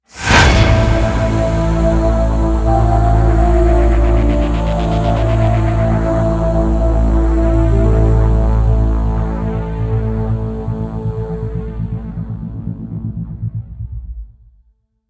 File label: Stinger of Death